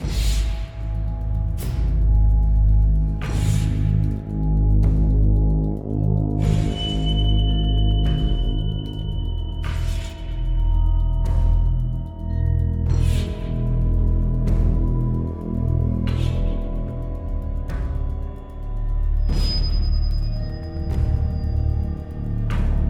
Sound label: loop Action